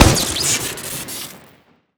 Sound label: grenade launcher shoot crit; tf2